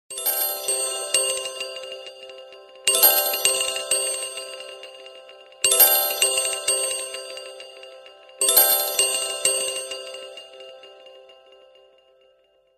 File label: vintage phone remix; ringtone